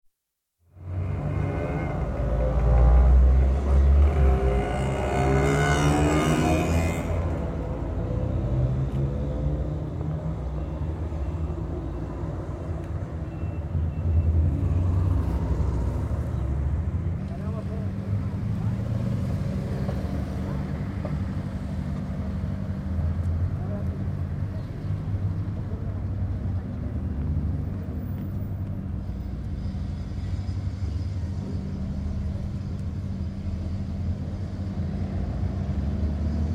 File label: Spain: Busy street, traffic with many motorbikes & mopeds; Traffic And Car; BBC